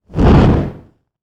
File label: Fire Fireball Large; Fire and Explosions